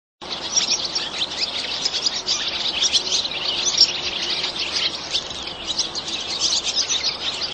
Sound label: Swallow